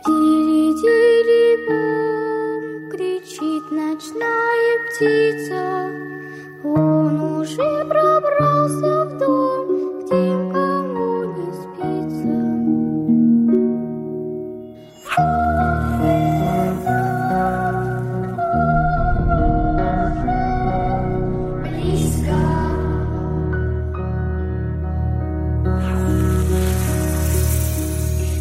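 Kolybelnaya Iz Horror Ringtone, lullaby from horror; ringtones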